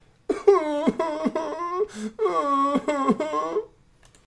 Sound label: cry out loud